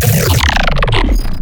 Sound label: glitch stab; Virtual Riot; Glitch Stabs